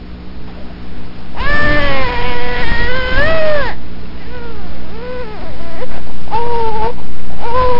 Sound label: baby; sblaster